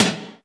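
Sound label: GATE tom; Crypton - DrumBase5000; GATE KITS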